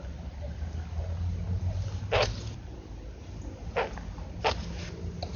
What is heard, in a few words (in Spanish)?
becacina pintada; lampa; municipalidad de lampa